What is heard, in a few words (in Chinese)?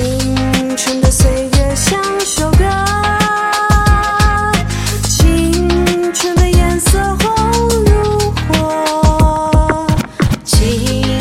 晨会结束; 初中; 广播室铃声系统